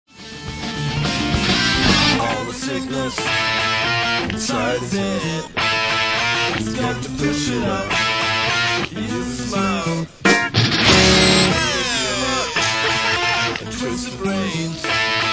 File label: rolls into fist sized ball